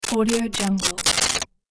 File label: sentinel glitch